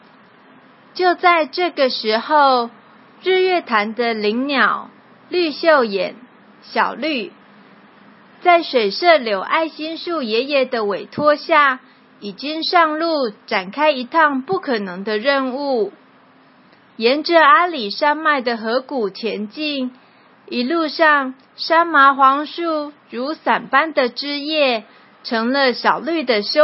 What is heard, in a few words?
music